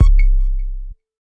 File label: say bow beep; Hip Hop; Hip Hop Specialty Kit; style kits